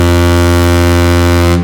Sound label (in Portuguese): buzina